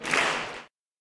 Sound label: Applause; Gensou Shoujo Wars: Dream of the Stray Dreamer